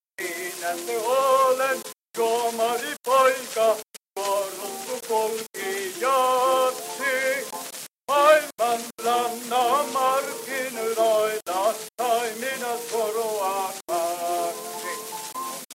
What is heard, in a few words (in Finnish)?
kohinaportti 24dB